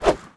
throw

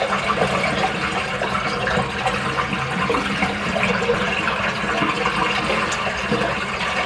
kitchen; Star Wars Knights Of The Old Republic I; XBOX

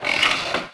seesaw up; Tony Hawk's Project 8; XBOX; houses

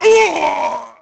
ranger death

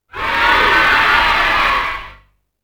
NOISY; UFCTapout1; XBOX

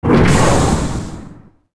crusher; movers objects

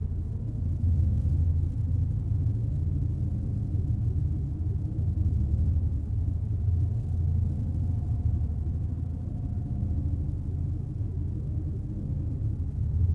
landing Ship; Star Wars Obi-Wan; XBOX

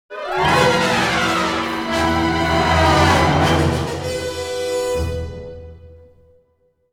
Scary Event, music; Mood Scary

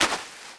Walk Dirt; Player; Footsteps

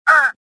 choke, worker; worker1

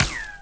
laser Bolt hit; impacts explos

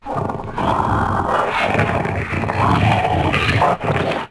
shake, Dino; Spino

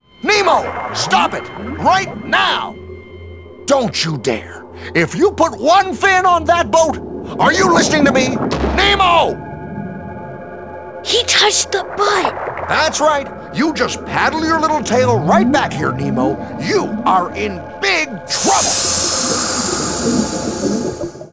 slap; XBOX; Finding Nemo; english

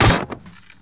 droid fall

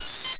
Beep

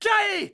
cry; True Crime Streets Of LA; XBOX

